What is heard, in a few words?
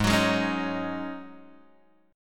G sharp 7th Suspended 4th G sharp, Guitar Open G